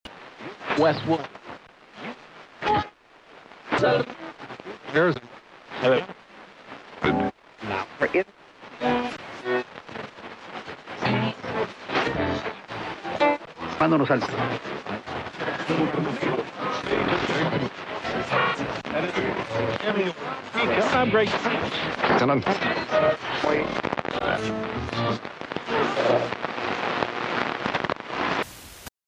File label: Tuning AM Broadcast, static